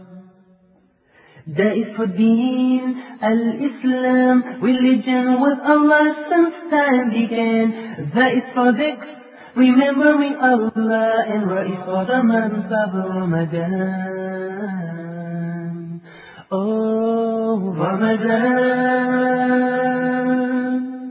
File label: Islamic Ringtone